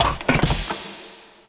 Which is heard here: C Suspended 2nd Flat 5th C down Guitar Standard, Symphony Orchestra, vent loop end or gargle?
vent loop end